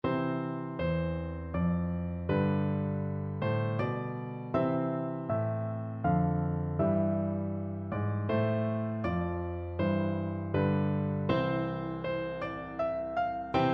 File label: god save the queen, piano duet